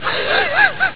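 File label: hyena laugh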